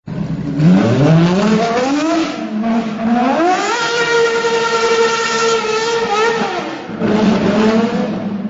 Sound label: Ferrari Fxx